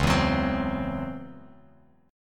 C sharp Minor Major 11th, Bass Standard; strummed; 9,7,16,17; JGuitar

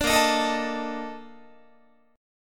A sharp Major 11th Guitar, chord; strummed

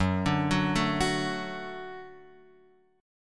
F sharp Minor Sharp 5th, Guitar, Open F; arpeggiated; x,1,2,1,0,1

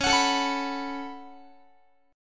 Db 7th Suspended 4th Sharp 5th Db down Guitar; x,21,21,19,22,22; Guitar-4A,4E,5A,5D,5Gsharp,6Csharp; Db7sus4#5 strummed

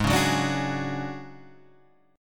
D Major 7th Flat 5th G sharp, chord

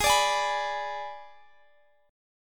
G sharp Diminished, Guitar, Standard; strummed; capo 2; x,x,18,19,21,19

